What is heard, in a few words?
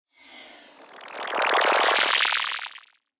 Whoosh Wash Front To Rear To Front; Production Element Imaging Element Accent Transition; Blastwave